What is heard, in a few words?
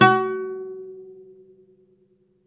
OUD F#3, Plucked Strings; E-MU Producer Series Vol. 7 – Old World Instruments